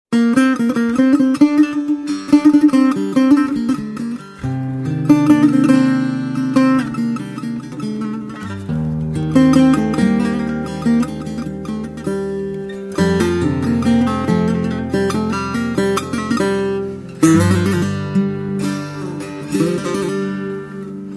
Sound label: Kurdi